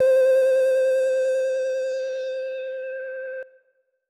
Lead; MPC expansion

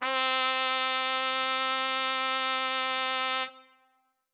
Brass; MPC expansion

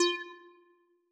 Pluck; RB Flava; rnb flava; MPC expansion

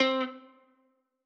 Guitar; 414 Bucks; 414 bucks mpc expansion

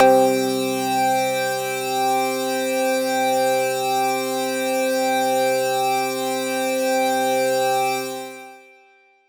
Lead; Space Jamz

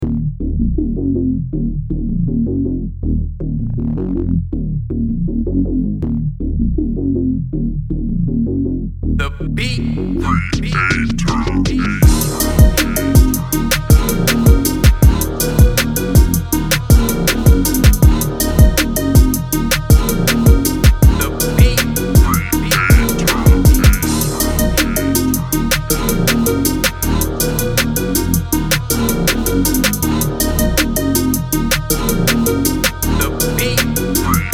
brass

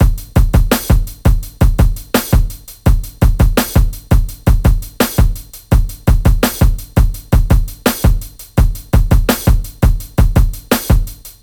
Backroom Slaps Break bpm84, drum breaks; concrete knock drum breaks vol 1

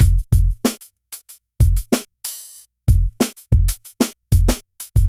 Trapdoor Rhythm bpm94, drum breaks; concrete knock drum breaks vol

